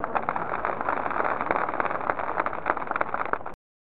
Clapping; PGA Tour Golf; 1991 snes

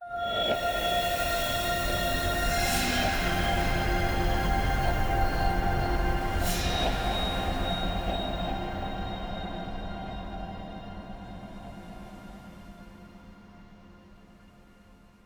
Flourish; New Storytime

